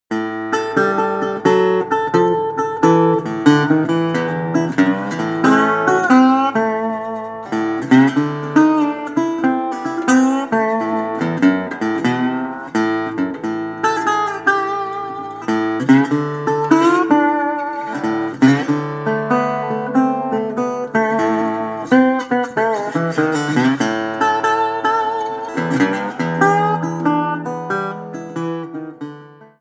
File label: Bottleneck Slow Blues In A